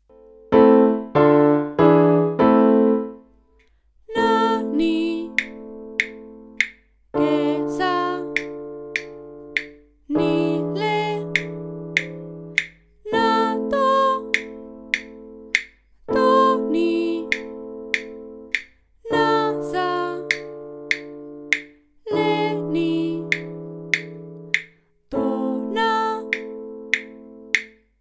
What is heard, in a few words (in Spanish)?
i iv V menor sílabas tonales con acordes la menor